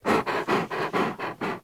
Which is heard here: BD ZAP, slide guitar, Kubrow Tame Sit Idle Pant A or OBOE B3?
Kubrow Tame Sit Idle Pant A